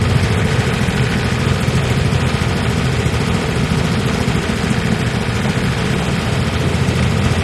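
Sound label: Motor Boat Engine Loop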